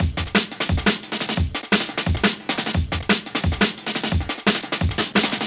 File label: Breaks, 175; Drum&Bass; EZ Rollers